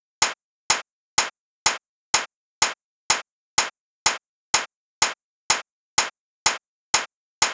House 125 Perc; Drums; Loops; Drum Construction Kits; Ghosthack; Drum Mega Bundle; Drum Hero 5

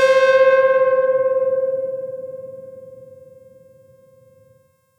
ARP2600 KEYS Detuned High - C; Synth; One Shots; Cymatics; Fantasy Synth Sample Pack

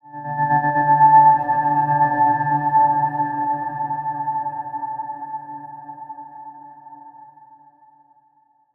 Glass Synth FX - Am 60Bpm; Construction Kits; STEMS; Ghosthack; SkyRealm; Kit 28 - Long Walk Home

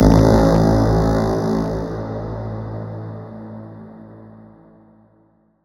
Viking Horn - Am 87Bpm; Construction Kits; STEMS; Ghosthack; SkyRealm; Kit 18 - Shivering Sea

